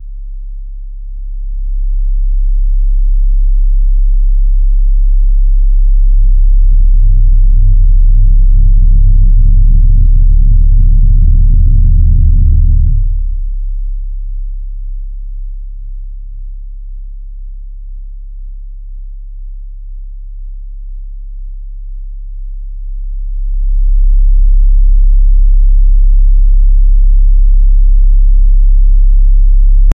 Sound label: deep rumbling sub bass